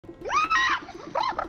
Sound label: gargle